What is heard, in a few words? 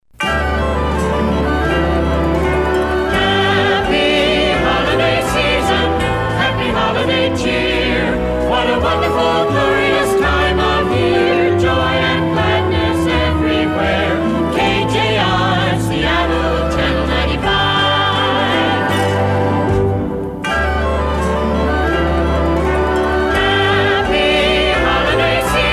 holiday jingles; KJR; KJRB; happy holidays from the 60s